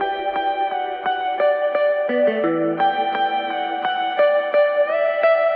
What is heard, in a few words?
guitar tense moments, Loops